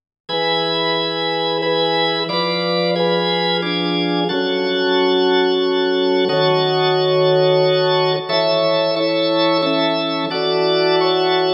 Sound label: Karaoke